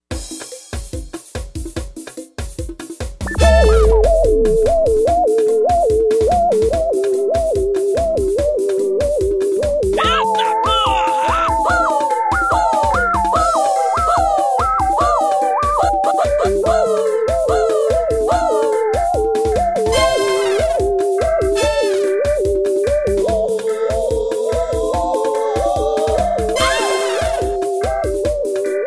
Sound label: groove